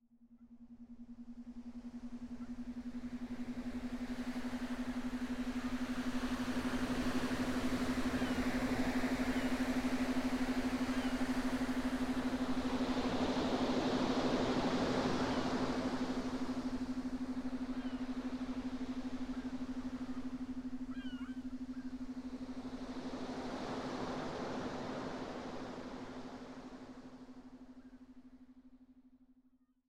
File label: SMR Beach